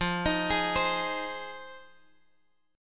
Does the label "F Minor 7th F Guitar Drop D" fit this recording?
Yes